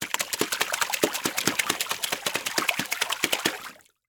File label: Liquid Water Water Feet Smacking Water